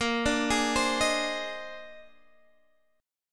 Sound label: Bb 11th, Guitar, DADGAD